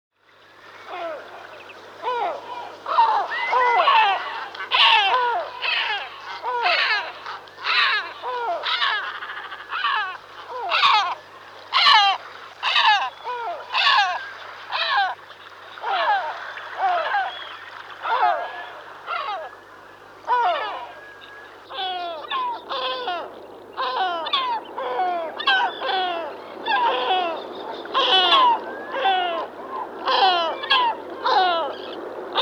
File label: Great Black-backed Gull